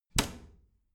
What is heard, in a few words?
bathroom cabinet door close heavy